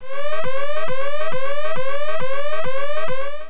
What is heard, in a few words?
win